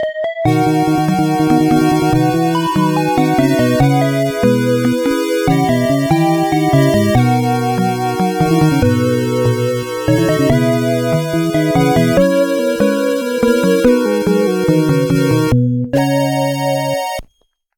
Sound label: Scene Clear; Psychic 5 Eternal